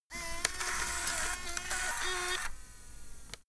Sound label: servo move click